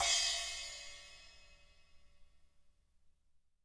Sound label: SPLSH, SPLASHES